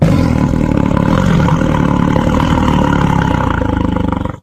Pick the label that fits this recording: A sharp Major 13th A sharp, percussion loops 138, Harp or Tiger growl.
Tiger growl